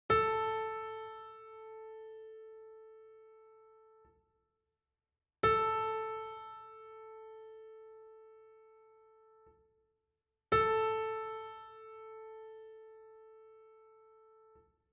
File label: Tuning Note Saxophone